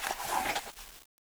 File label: gun pickup; wizardwars